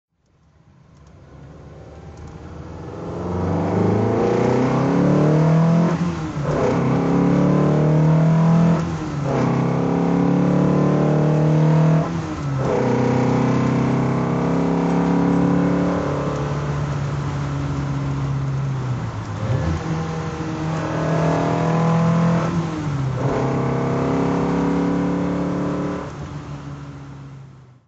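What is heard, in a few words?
lancia fulvia fanalone drive, Engine sound driving; Lancia Fulvia HF 1.6 Fanalone, 1969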